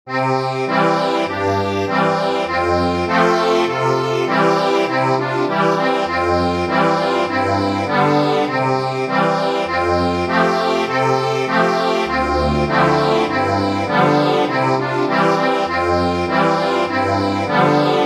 accordion

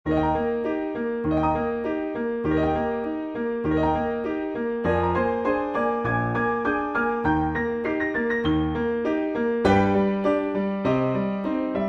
piano

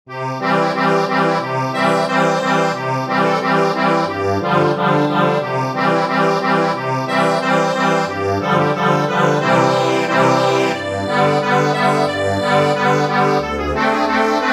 Accordion

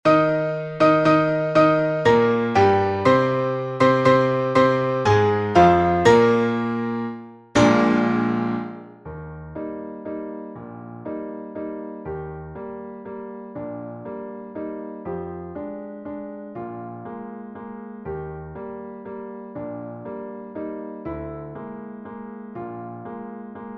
piano; Veleta

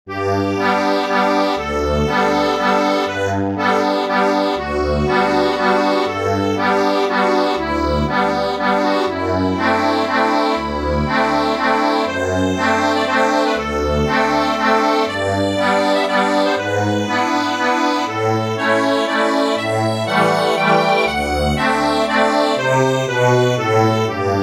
accordion, sheet music

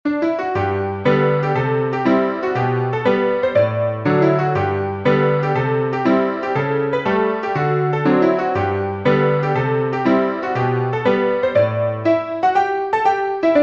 Barn Dance PIANO; Tiny Tims

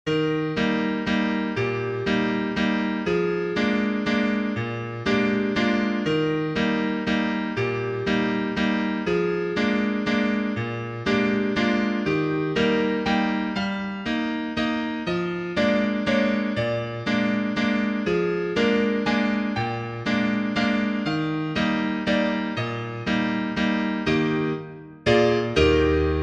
piano

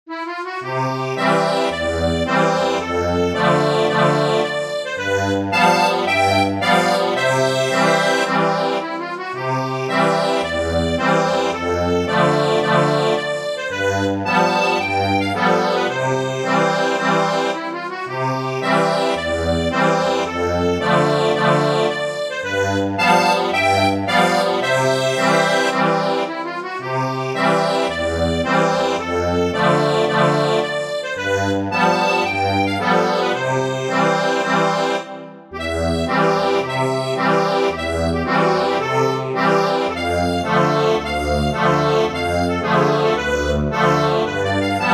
ACCORDION

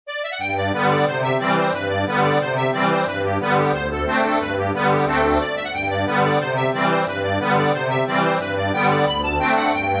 Sailors hornpipe ACCORDION

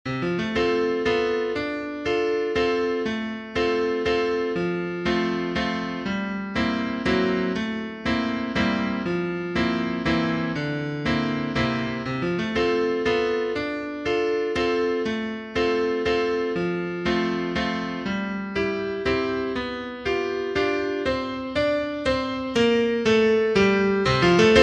Piano

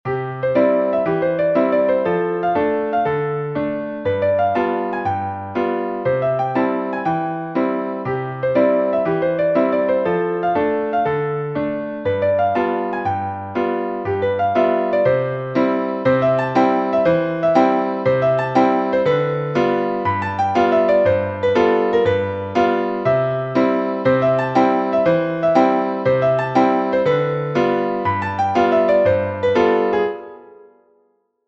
The Dancing Dolls piano

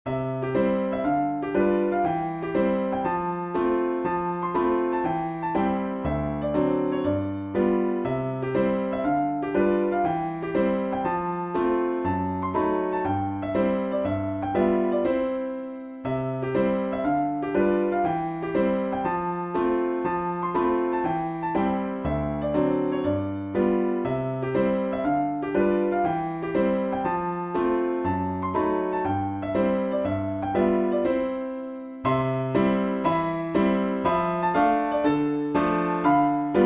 PIANO

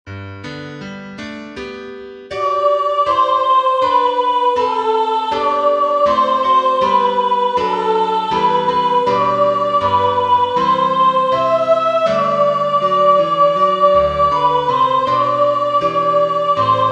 piano

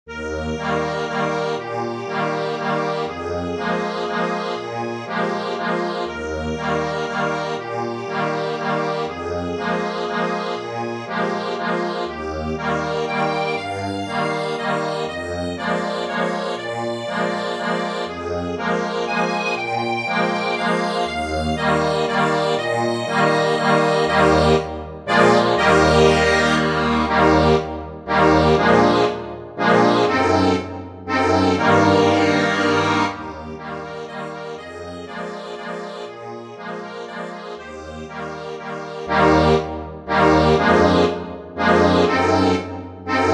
accordion

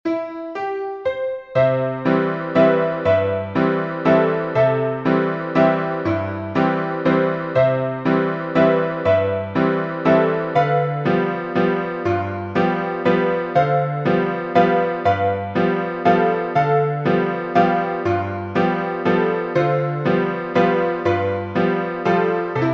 PIANO

